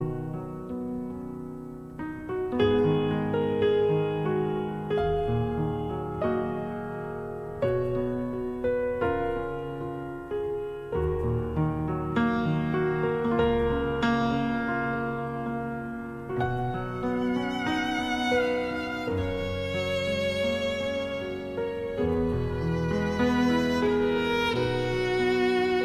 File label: The Sacred Romance Instrumental